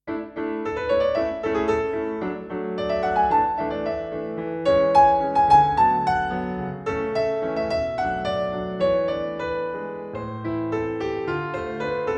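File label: piano solo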